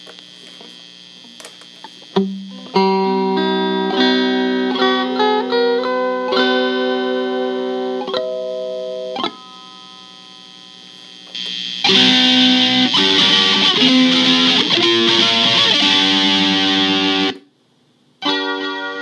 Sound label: 3 String Guitar